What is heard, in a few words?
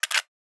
Metallic Gear; Survival Game Sounds - Lite Edition